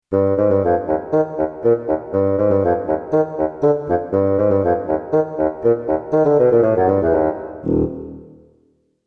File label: Bassoon; roland; roland demo cd-3